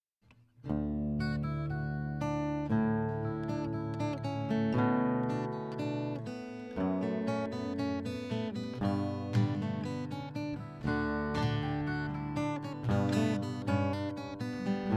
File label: Chipped Baroque